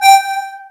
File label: Triforce Gateway; Stepping on a Triforce Gateway (3 tones)